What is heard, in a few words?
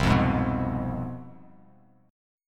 C Major 7th Suspended 4th Sharp 5th, C, down, Bass Standard; strummed; CM7sus4#5